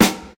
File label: good acoustic snare b key; snare drum samples